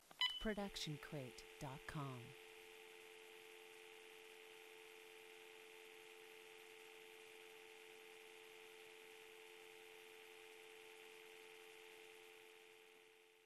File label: Phone Beep to Dial Tone